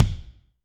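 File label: Kick Ambient 24 Inch, Alesis D4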